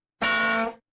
hitting

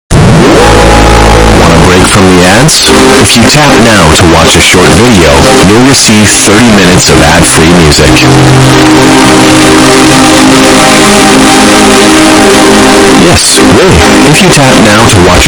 bass boosted; spotify premium

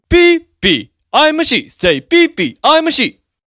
sheep

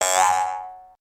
bouncing sound single

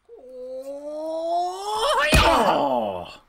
huuuuaaaa

